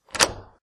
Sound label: interaction; deathmatch; action warps